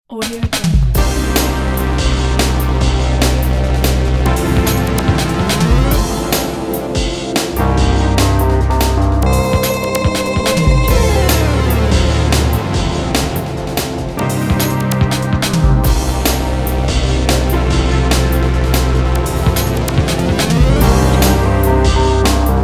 toxic drum and bass

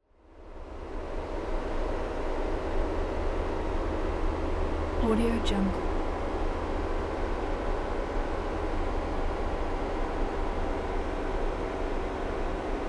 factory hum; mountainaudio

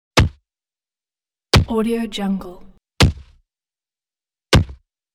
hit with club; chicasound

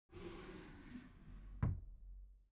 office desk drawer close soft; foley; smartsoundfx

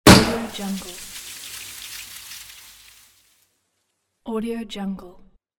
confetti petard; monkey-mama

